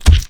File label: smash zombie; zombieroad